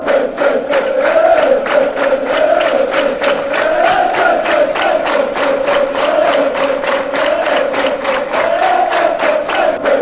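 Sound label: de de de swansea, football chants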